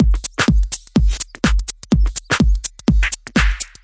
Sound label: 125 bpm swinging minimal, drum loops and breaks